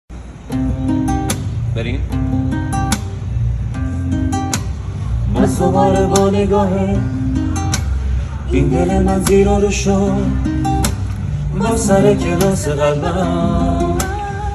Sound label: Guitar